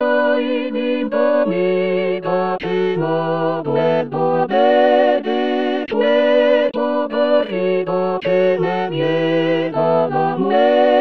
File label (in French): Tutti, choristes; Ay Linda amiga